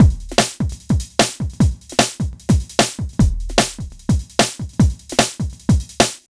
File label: Beat, Drums